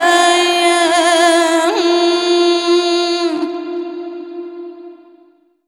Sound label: Ethnic Female, Vox FX; Drum & Bass; Total Drum&Bass